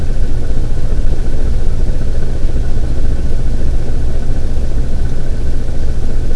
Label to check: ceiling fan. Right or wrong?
Right